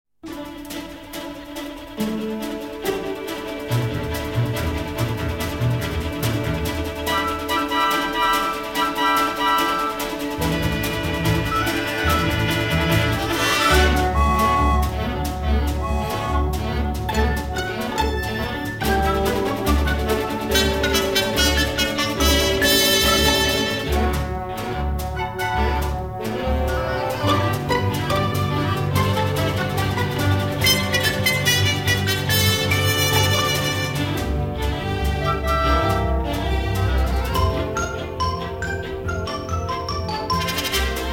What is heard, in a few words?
Orchestra